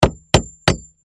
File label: Hammer Hit Nail, Industry, Hammer, Hit, Nail, Heavy, Spike, Three, Times; Hammer Sound Effects; Blastwave